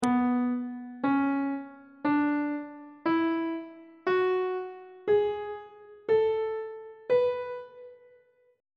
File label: c flat dorian mode; on treble clef